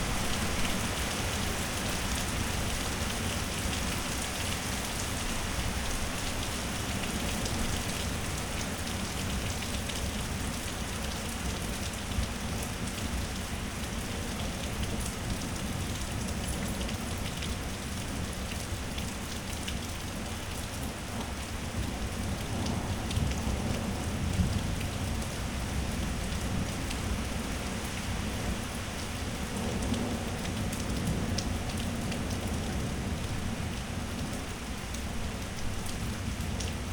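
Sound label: ambience rain heavy to medium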